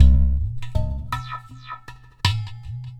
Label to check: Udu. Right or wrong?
Right